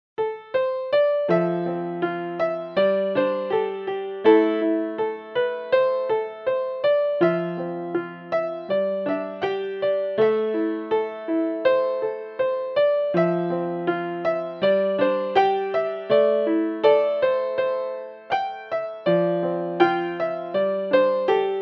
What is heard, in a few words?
piano